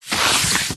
office file folder wallet card paper sheet document slide in or out